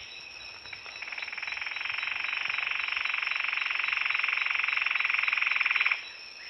Cichlocolaptes mazar-barnetti rattle spontaneous at dawn